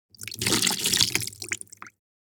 Wet sponge squeeze dripping water; cleaning

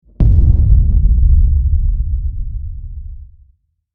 Deep intense boom rumble; cinematic

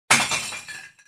Dumpster trash can impact bottles glass breaking

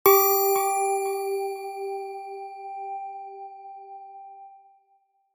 Meditation bell ring chime tone

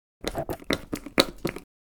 Soap dispenser bathroom pushing or pressing

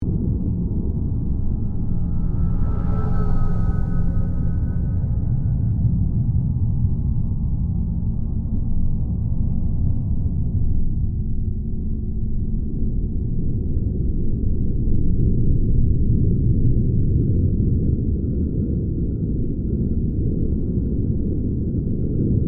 Space ambience spacecraft passing by

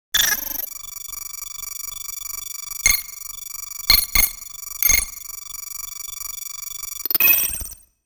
Futuristic data calculations long

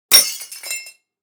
Tea cup smash glass impact

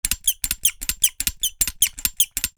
High pressure handgrip sprayer spraying; hand tool

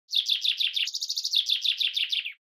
Common chaffinch chirping in nature

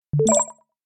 Bright message alert notification tone

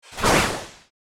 Text letter reveal intro anime

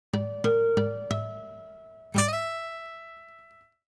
Video game reward chest opening guitar

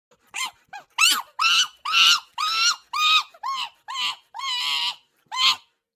Central chimpanzee screeching and screaming

